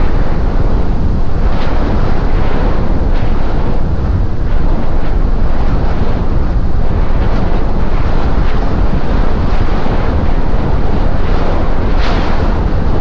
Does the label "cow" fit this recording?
No